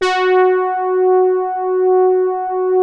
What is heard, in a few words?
soft brass, poly hard F#4; Music Store - Claudius Diemeter Schwerlos